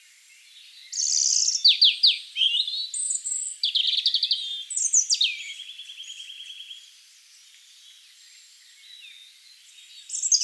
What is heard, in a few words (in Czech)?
červenka obecná Erithacus rubecula